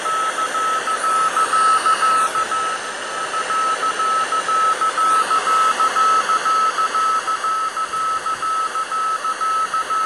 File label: Strange Signal On FM 75,1MHz